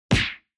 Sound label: Hit